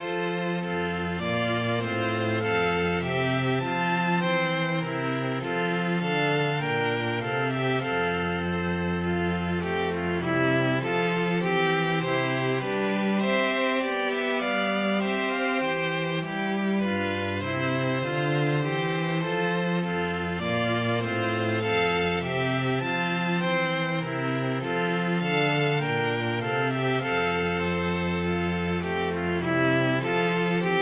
bouree, string quartet

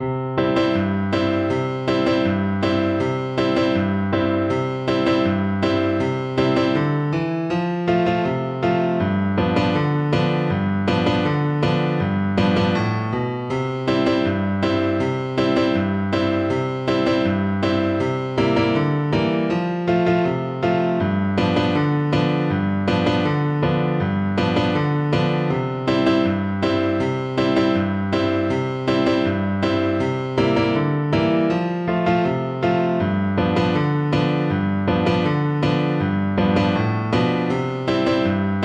Oboe

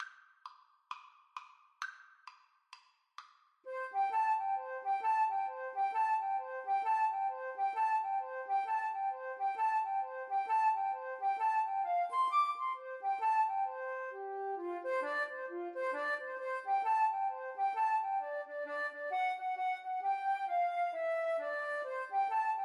jingle bells boogie, Flute Duet

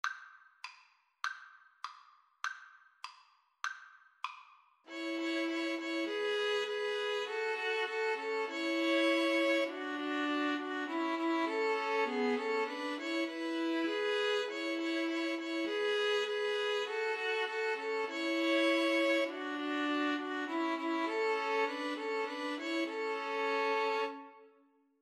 old st nic, String trio

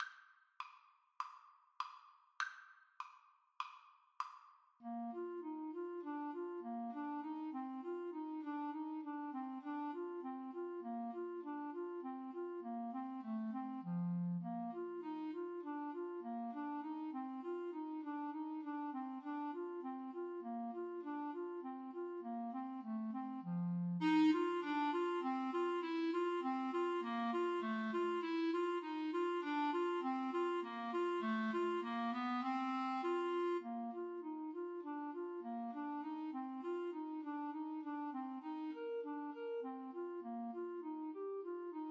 Clarinet duet

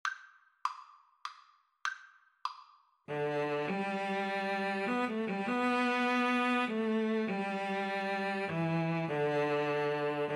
Guitar Cello Duet

